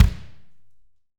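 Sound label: AMB KIK, ambient kick, studio; AKAI S6000 CD-ROM - Volume 3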